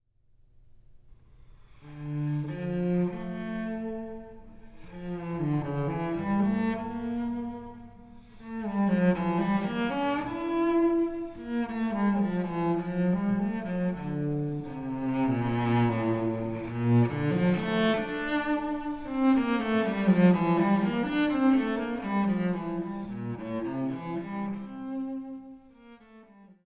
BWV1008 Suite No.2 in D minor Prelude

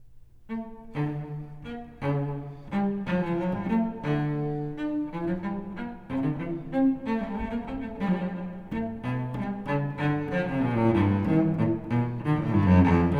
BWV1008 Suite No.2 in D minor Gigue

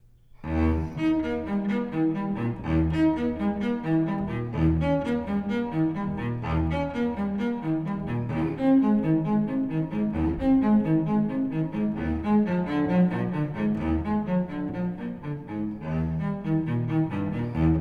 Suite No.4 in E flat Major Prelude; BWV1010

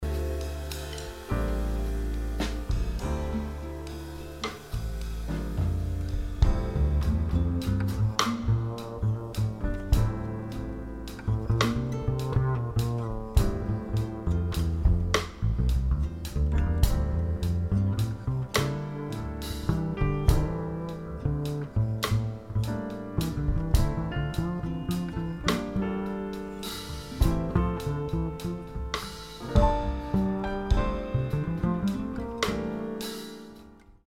guitar bass

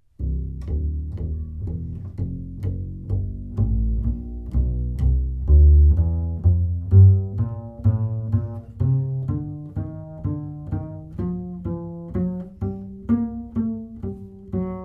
Room bass response

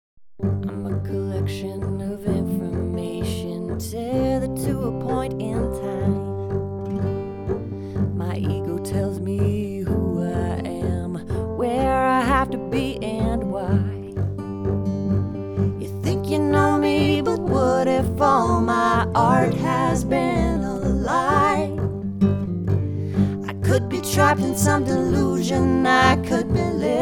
Center channel and soundstage width

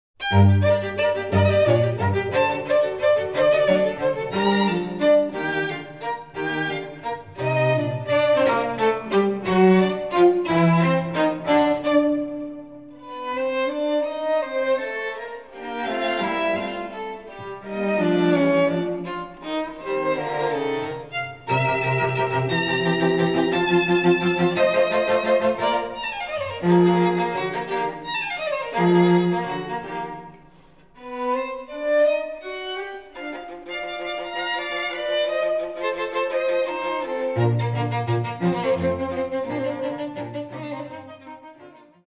violin violin Quartet No. 6 in B flat

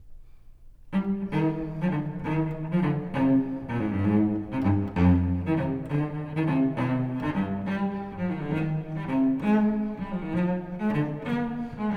BWV1011 Suite No.5 in C minor Gigue

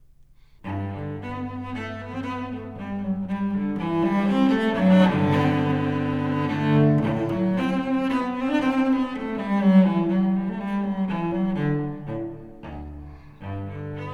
BWV1007 Suite No.1 in G Major Menuet

